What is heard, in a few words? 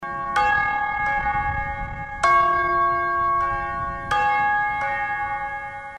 Clock Sound